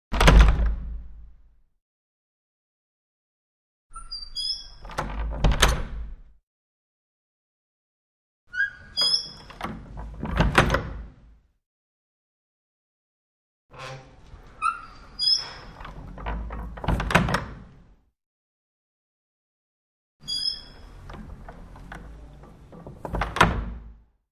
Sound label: Small Wooden Door 2 Cl; crash slams